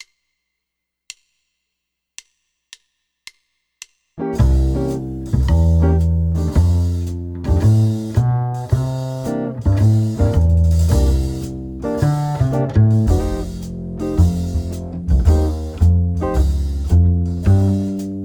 blues piano play along, jazz; LMEA All-State Jazz

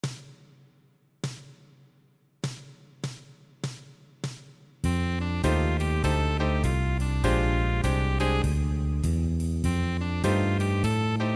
play along guitar

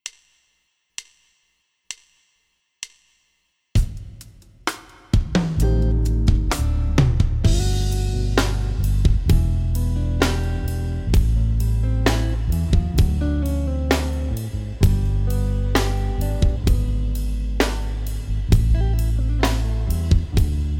piano play along, smooth ballad, jazz

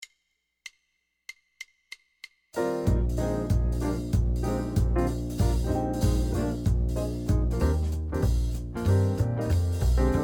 horns play along, up tempo swing, jazz; LMEA All-State Jazz

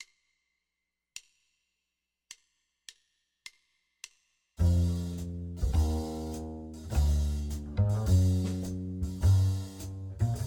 Jazz Med Tempo PIANO GUITAR play along Comp; LMEA All-State Jazz

